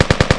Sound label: Calico Pistol; pmki srednie; bron reczna